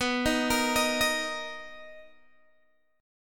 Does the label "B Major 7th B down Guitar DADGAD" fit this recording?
Yes